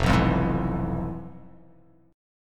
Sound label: F Minor Major 7th Flat 5th